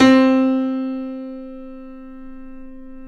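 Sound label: grand piano C5; DANCE PNO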